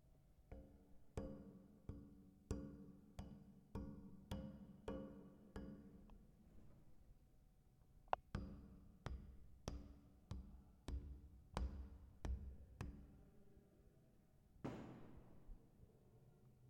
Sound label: Footsteps On Pipe Metal